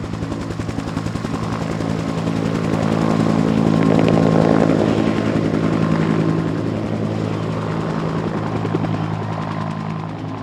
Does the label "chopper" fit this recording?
Yes